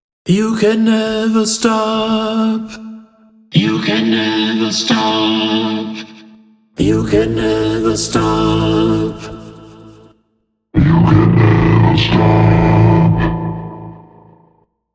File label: vocal synth; Elektronisch verfremdete Vocals; auto